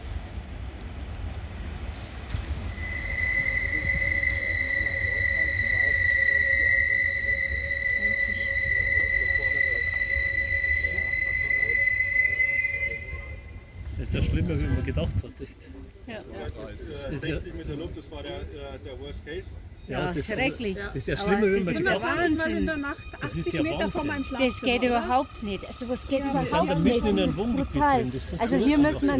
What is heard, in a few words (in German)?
AUDI brems